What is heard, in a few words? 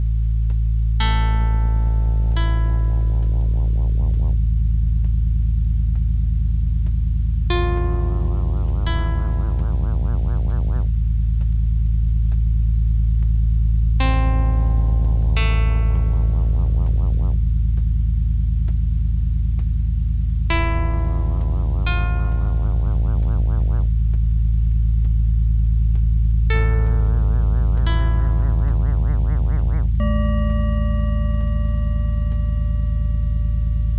mystery